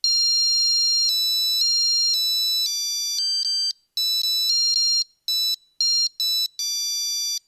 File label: Celebration, Eieruhr; Eieruhr zum Mitkochen; Geburtstags PiepEi